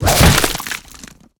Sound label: Spider Trap Grab; Lurker; Evolve; Gorgon